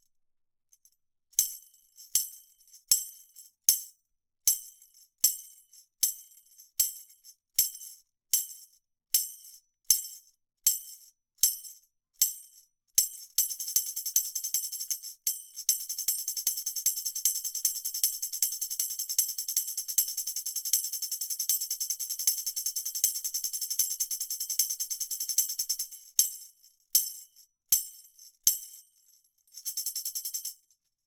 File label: tamb